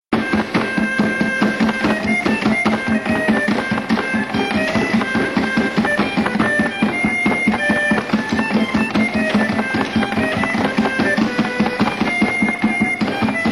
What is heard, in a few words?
chord organ blues